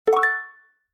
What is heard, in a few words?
beep old